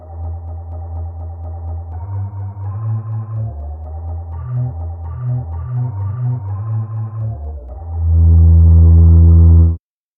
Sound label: piccolo; bass